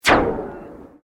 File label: Sweep Fast; Created sweeps, swooshes and other movement effects; Sweepers; Sound Design; Foundation4